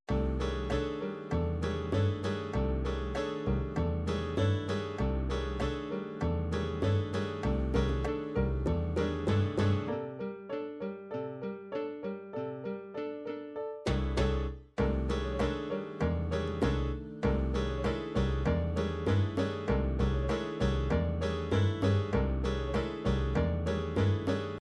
latin bolero lento

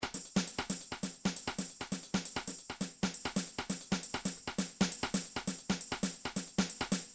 trance midi loops beats

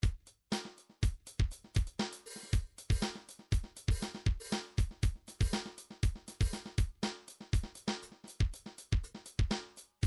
midi drums percussion; Midi Vol 4 Drums & Percussion

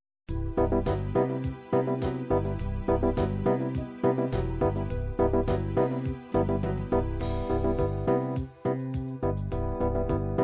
dance party, midi

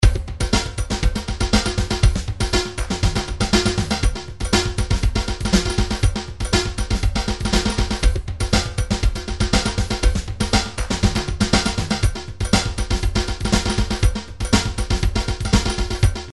drum grooves midi loops hiphop; Steinberg Nice Beats 1000

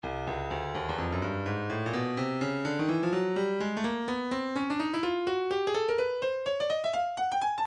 breakbeat midi files 125 129 bpm 126; Twosome C; Stylus Breakbeat Midi Files

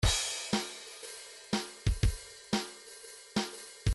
swing 2bar, midi

